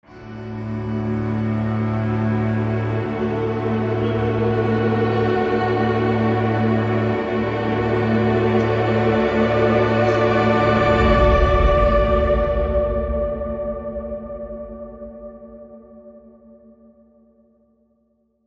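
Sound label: Ethereal Church Crescendo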